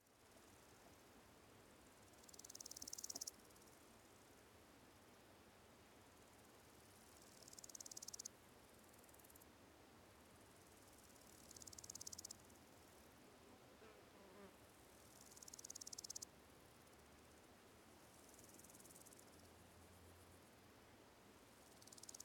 Ambience, Countryside, Quite Fields, Slow Cicadas, Close, Fly; AmbiX; Sonik Sound Library; Spatial Countryside